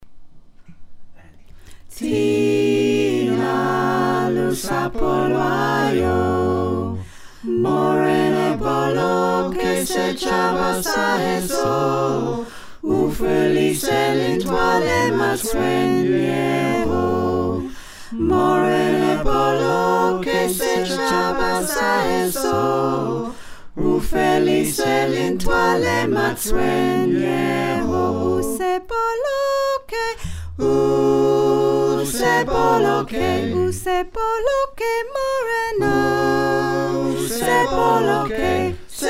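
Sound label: soprano alto tenor bass, choir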